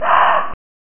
Attack (Mummy - Ghost); Dungeon Master and Chaos Strikes Back; Amiga

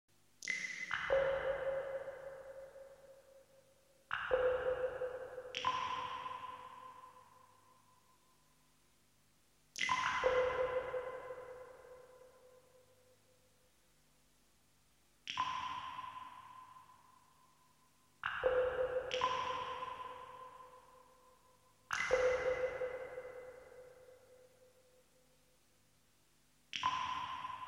Music, Turbografx; Theron's Quest (USA, Turbografx) - Track 17

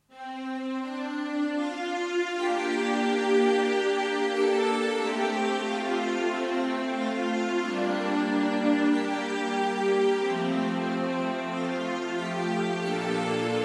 Music, PC Engine; Theron's Quest (Japan, PC Engine) - Track 03

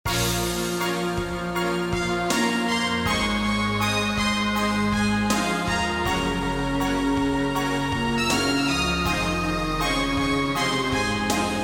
Music; Theron's Quest; PC Engine

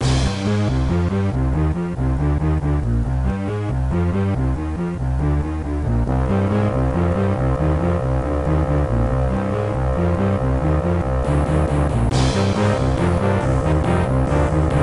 Music; Dungeon Master II; Macintosh

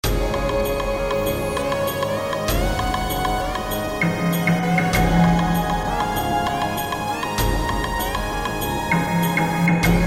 Music; Dungeon Master Nexus; Sega Saturn

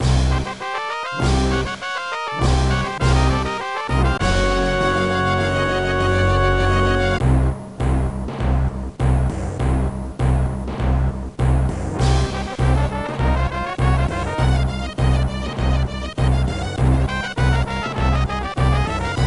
Music; Dungeon Master II; Macintosh

